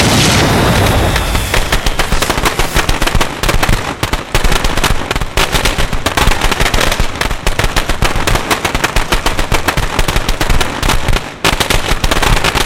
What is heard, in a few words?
loud firewerks crate explo